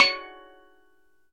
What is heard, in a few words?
steel drum